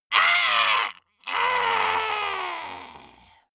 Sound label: monster die high